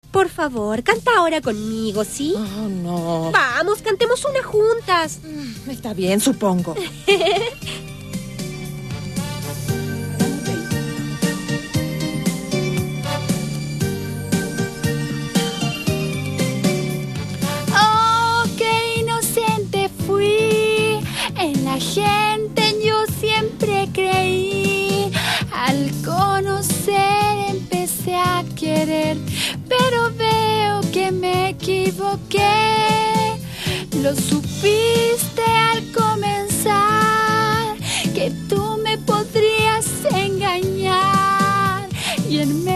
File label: karaoke